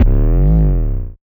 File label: 808 glide Bb; 808s